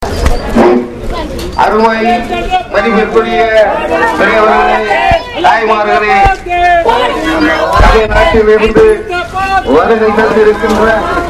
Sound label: election sounds, india; Ted P. Eugenis